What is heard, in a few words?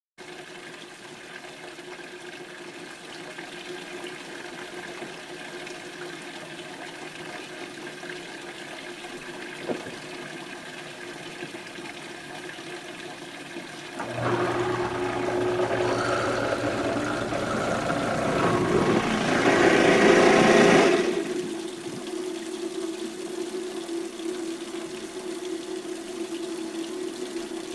Garbage Disposal Water Running Then Disposal Turn on; Household